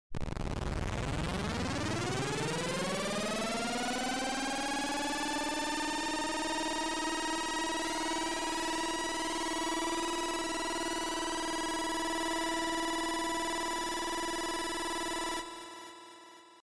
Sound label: charge; エネルギーチャージ1